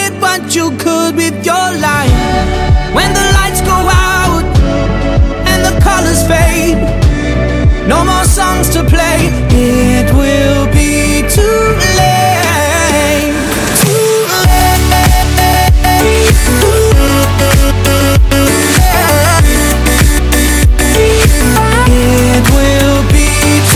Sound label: English Ringtone